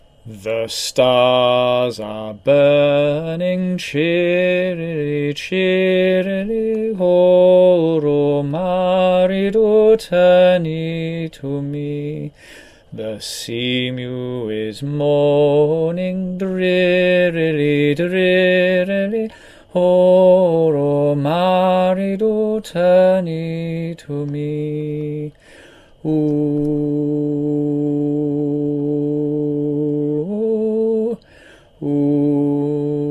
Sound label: bass